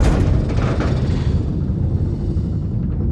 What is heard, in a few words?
piston travel; ambience spaceship